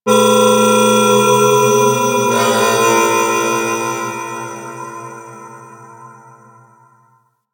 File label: Crp Ship Temple Moving Bells Two G; Ambience; Warframe; Corpus Ship Remaster